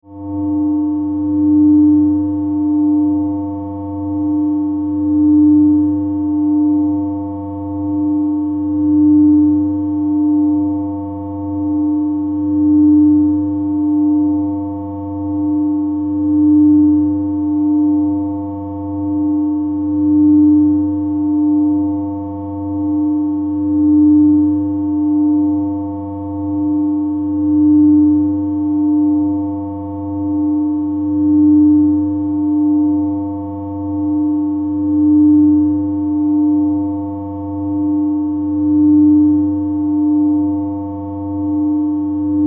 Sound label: Energy Drone, Energy, Drone, Modulation, Power, Source